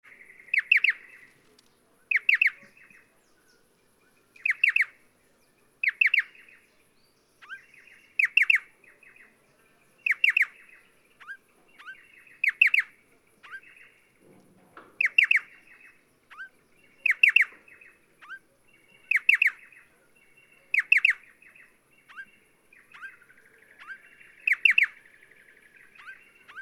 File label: Pardalotus Striatus; Striated Pardalote; Call 2